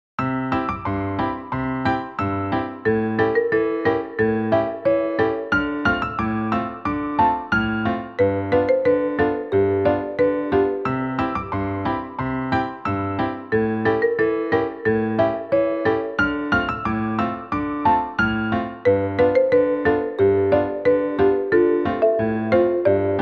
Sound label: Newage; 밝고 맑은